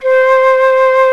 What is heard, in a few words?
C4 FLUTE; ORCH.COMP